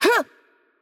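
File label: Attack Light, Portuguese; bloom minigame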